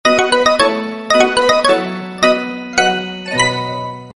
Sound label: sms tune